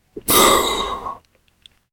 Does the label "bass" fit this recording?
No